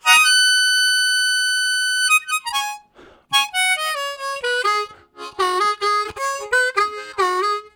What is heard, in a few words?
High Fizz, Harmonica Riffs; Zero G